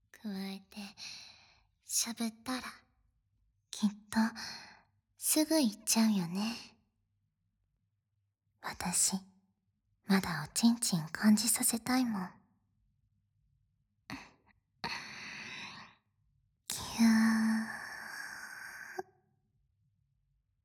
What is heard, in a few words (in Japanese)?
耳舐め 手コキ, ASMR; SE無し; 耳舐め手コキ-その2